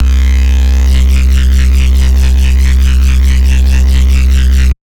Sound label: Bassline, Dubstep and Trap